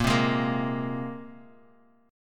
A sharp 7th Suspended 2nd; strummed; JGuitar

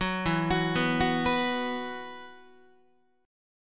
E Suspended 2nd, Guitar; Esus2 arpeggiated; 9,12,9,9,10,10

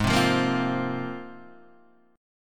G sharp 6th Add 9th G sharp Guitar Half Step Down

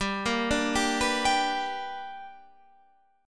B Minor Major 7th Sharp 5th

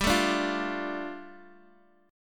G 7th Suspended 2nd G, Guitar Open D; x,x,5,3,5,3; down

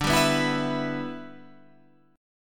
G 6th D

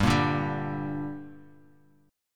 G Minor G; strummed; 15,13,12,12; JGuitar

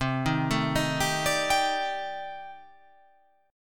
C Minor 6th Add 9th C

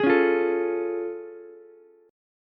A Augmented Major 7th A, Ukulele; strummed

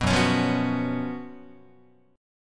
F sharp Major 7th Suspended 4th, Guitar; F#M7sus4 Chord; strummed

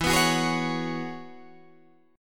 E 6th Add 9th E down Guitar Open D

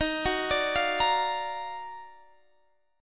D sharp Minor Major 9th D sharp down

